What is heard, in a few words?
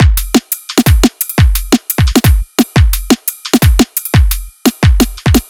Stutter Funk, Drum Loops, 174; M8; Electronisounds